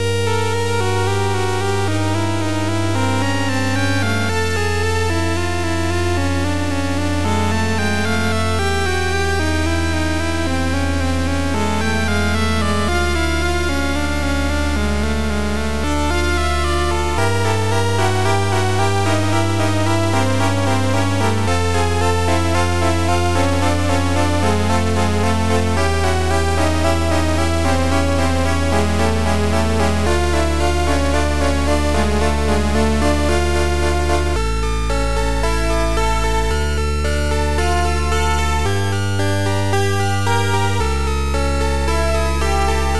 Dungeon; Lodoss Tou Senki 2; PC Engine Super CD-ROM2

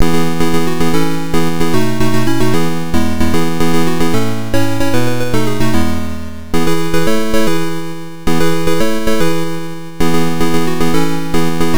Level Completed; The Amazing Adventures of Mr. F. Lea; arcade

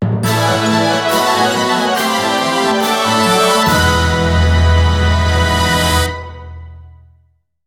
Jingle ~ Clear

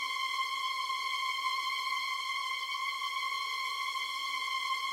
Helicopter Crash; Alone in the Dark; PS2; PS3; Wii; Xbox 360

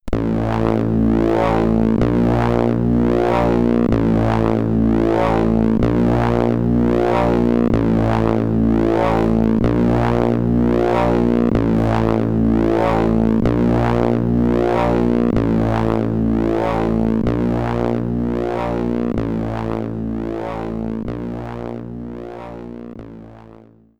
SID Stereo; katakis; commodore 64

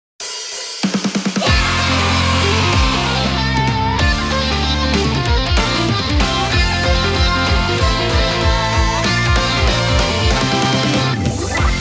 Jingle, Title; Taiko no Tatsujin Drum 'n' Fun

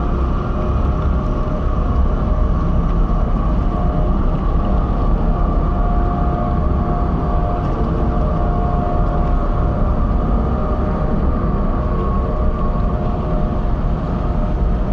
ambient gloomy noise; bgm

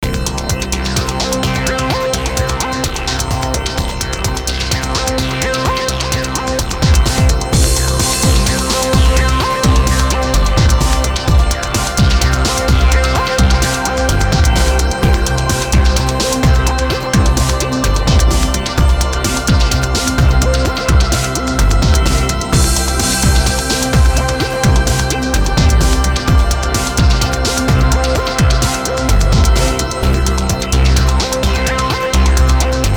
Liberated Bass; Arkanoid vs Space Invaders

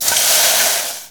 deliver order; SpongeBob Diner Dash

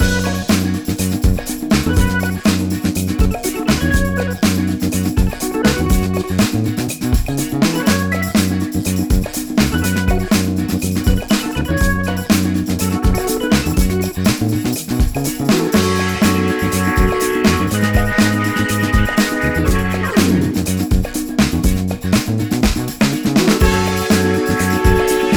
Boss Stage Loop

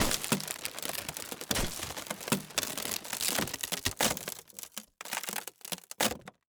Wood Break

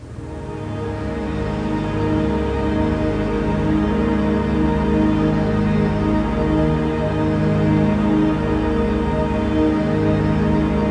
Pad; Great Eagle 2

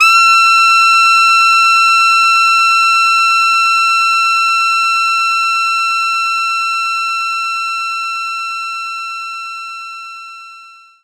Alto Sax F6; knights try

